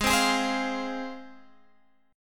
F Minor Ab

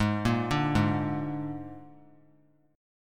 Ab Suspended 2nd Flat 5th Ab, chord; arpeggiated; JGuitar

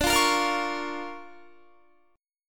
D Minor Major 7th Sharp 5th, D, Guitar; DmM7#5; strummed

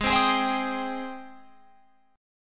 Ab Major 7th Ab down Guitar Open F; AbM7 strummed

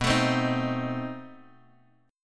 B 7th Flat 9th B down, Bass Standard; B7b9 strummed; chord shape; JGuitar

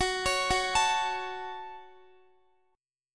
Gb Minor Guitar 4E,4A,5D,5G,5C,6F; arpeggiated; x,x,16,18,18,16

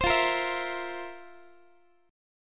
F Major 7th Suspended 4th, Guitar; strummed

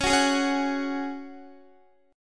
Db Suspended 4th Db, Guitar; strummed

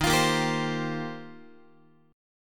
D sharp 6th Add 9th, Guitar; strummed; D#6add9; x,11,8,8,11,11; JGuitar

